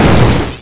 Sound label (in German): blitz hit; hurrican